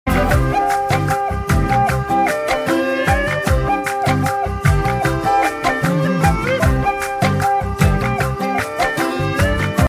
instrumental ringtone

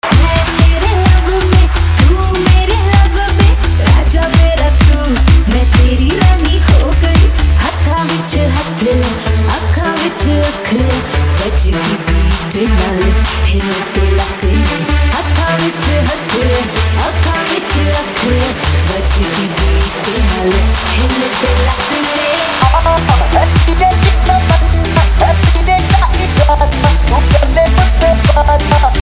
female instrumental ringtone, bollywood

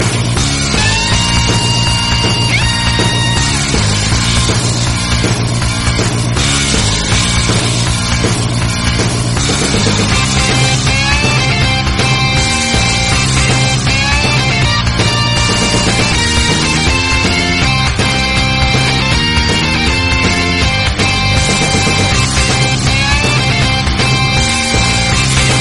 bombastic metal musical tone; samsung ringtones

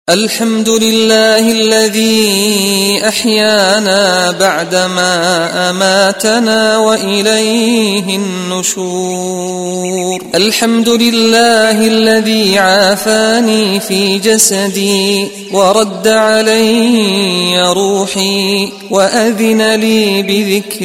morning duaa ringtone

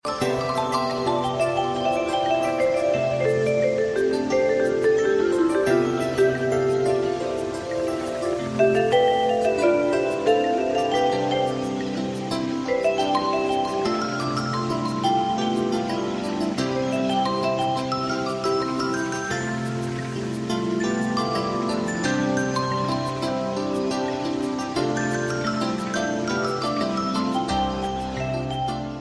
musical tone ringtone

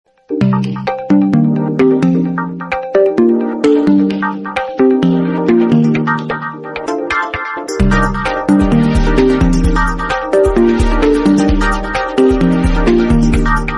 nokia latest ringtone

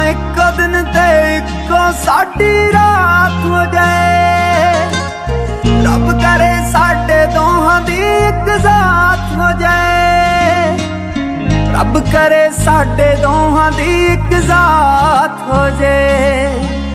punjabi ringtone

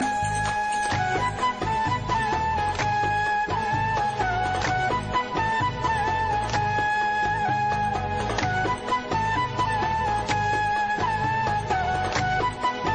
flute ringtone, islamic ringtones

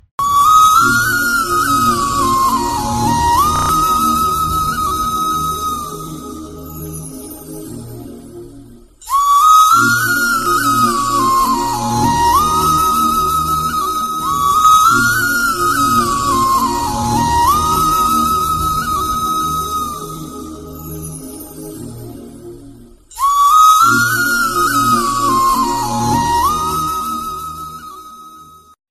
english music

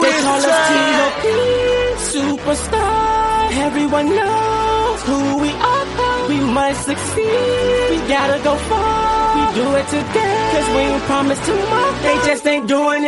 English song tone ringtone

